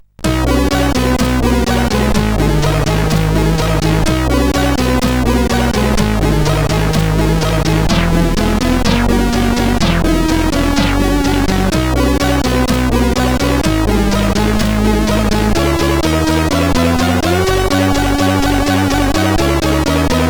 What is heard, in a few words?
Dance sid CSG8580R5; IOWBWY Dance